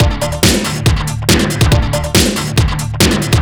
Overdrive, Drums Beats

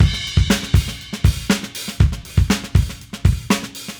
Funky Drums Crash; Sonic Foundry (Sony Creative Software); What it Is! 70s Analog Funk; The Funky Mama

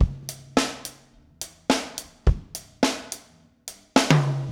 Garage Funk Drums Fill, 70s Analog Funk; Sonic Foundry (Sony Creative Software); What it Is!

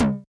Yamaha DJX 2B, Hard Tom

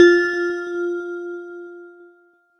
bell F; Polyend; ARC Noise

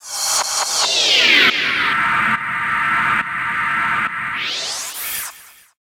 NRG Percussion, Trance Drums

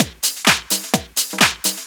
Latin Loop 128 BPM

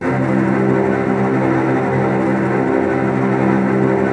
Virtuoso Strings, TRM FX; ILIO; Kirk Hunter Virtuoso Strings VOL-2; 10CL TRM FX

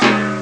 big tom tom; Amiga Soundtracker Sample Packs

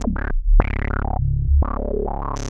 C#1, VCF; CMP - Analogon; SH-VCF

